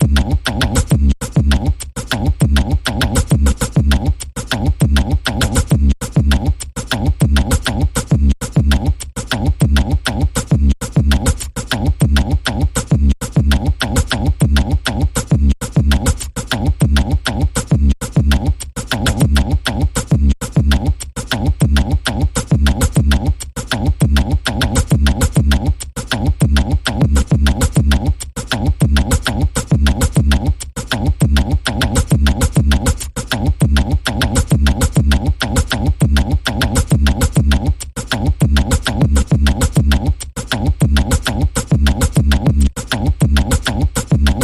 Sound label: Rhythmic Percussion Variation Long Version